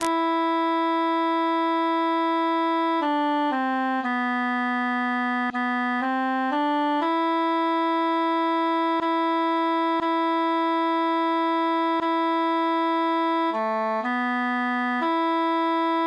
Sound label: altus solo; weep o mine eyes